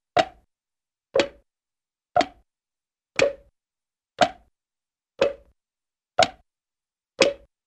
Hollow Slow Clip-clops (ie Slow Clock Ticks), cartoon; Hollywood Edge; Cartoon Trax